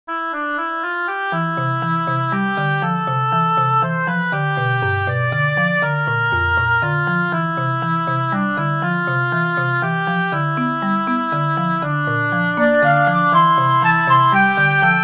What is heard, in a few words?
Timelapse Music